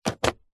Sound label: vehicles car suv nissan patrol 1998 plug into 12v socket